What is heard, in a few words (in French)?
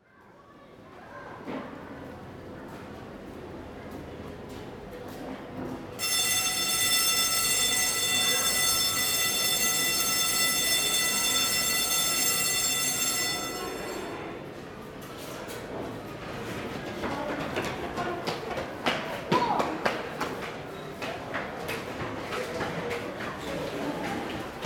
Ambiance sonnerie